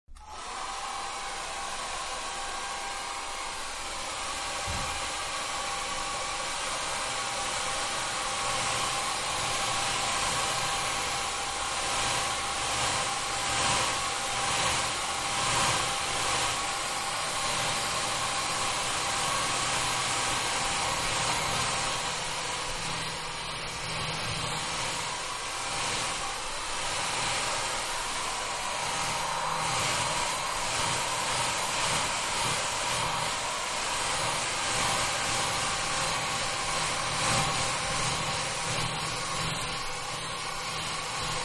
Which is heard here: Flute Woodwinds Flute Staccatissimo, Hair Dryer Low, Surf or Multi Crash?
Hair Dryer Low